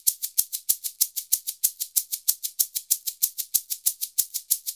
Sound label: SHK, Shaker; Univers Sons; Basicussions